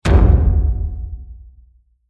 Metal Drum Distorted Impact Stinger Horror Robot; Tom Hutchings

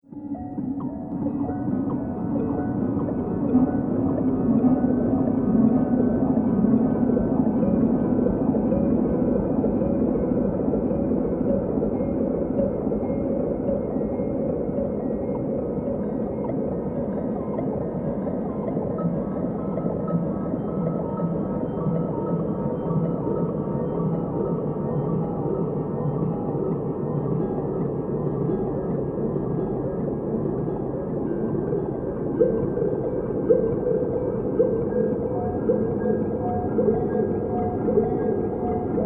Soundscape Sinister Lab; Scary Soundscape Sound Effects

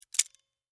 Industry Vice Grips Open; Hand Tools

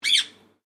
Bird Parrot Indian Ringneck Chirp

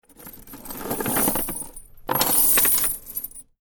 Heavy Chain Metal Rattling Twice; Chain sounds

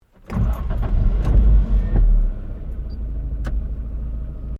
Car Toyota Starlet Startup; Toyota hire car in Japan; Vehicles; Cars

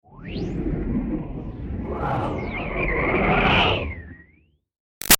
Movement Body Fly Thru Dynamic; Sound Design; Rushes; Big Room Sound

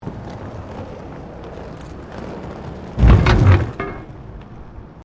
ROLLERBLADING MANHOLE COVER; Sports

